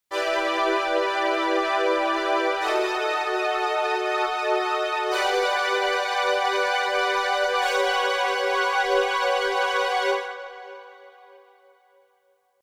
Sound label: Synth Pad